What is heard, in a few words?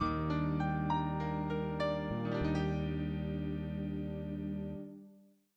JINGLE